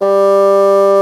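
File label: hard bassoon G3; Northstar - New Gold VOL-2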